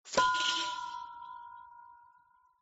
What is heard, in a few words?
incorrect